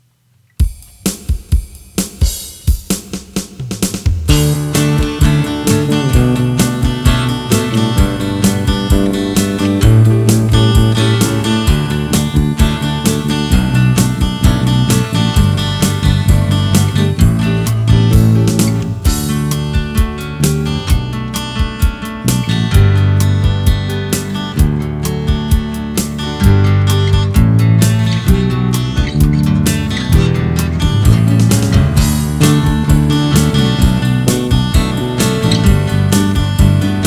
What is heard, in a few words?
abide o dearest jesus no vocals; hymns